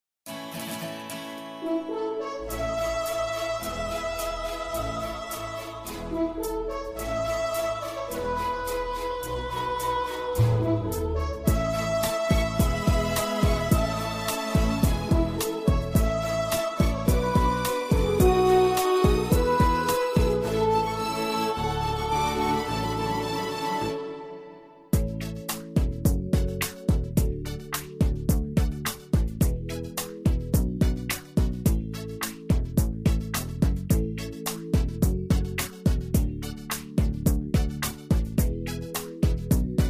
X'an Devotional Karaoke; christian devotional

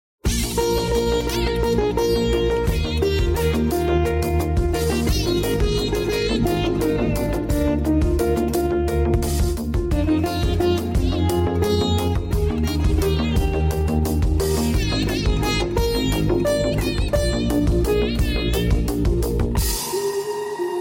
Vocal Removed; Sound Fortune

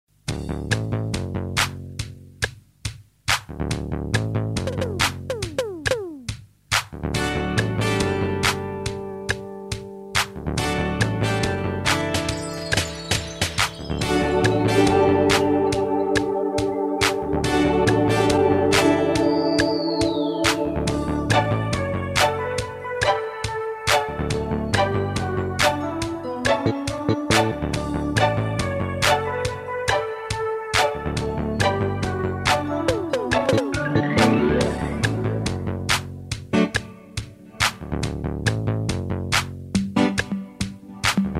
X'an Devotional Karaoke

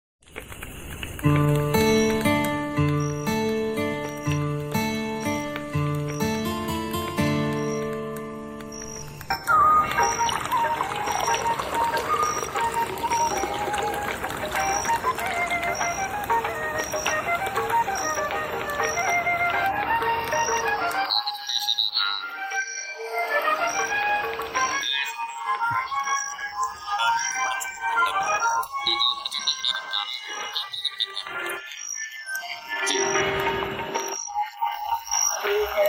Romantic melod

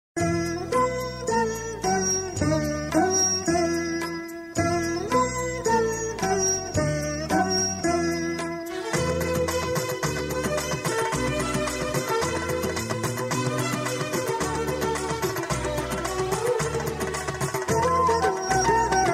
Hindu Devotional Karaoke

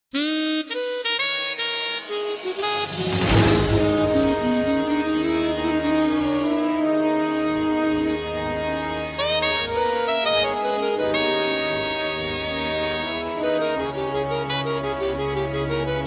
X'an Devotional Karaoke

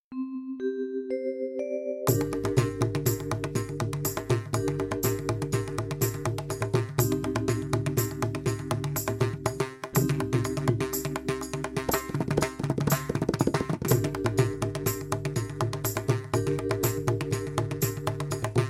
Karaoke; Rakkuyilin Raagasadassil

